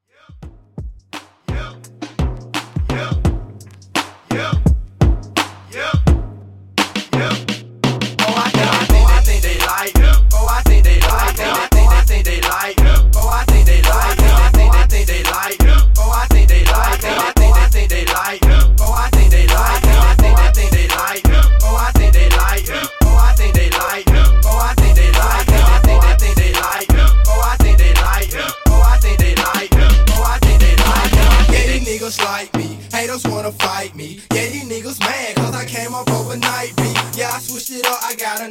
In Outro 85Bpm Dirty

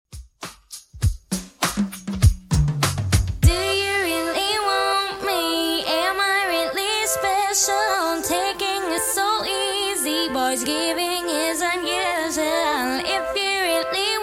90s Redrum 100bpm

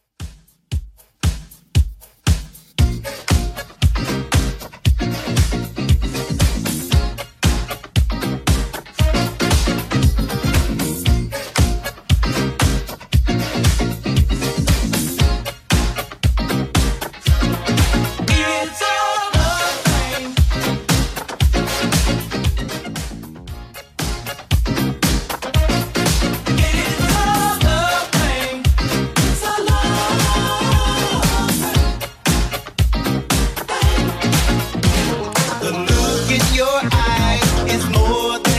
80s Redrum 115bpm

